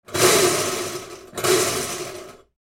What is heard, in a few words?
Garbage Disposal on & Offs Without Water; Household